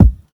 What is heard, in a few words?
smooth bottom end kick single shot a sharp key